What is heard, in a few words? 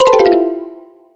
wrong Answer